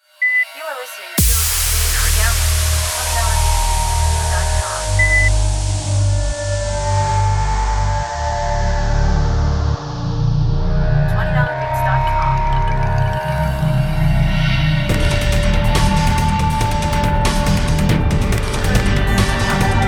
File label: DubStep, beats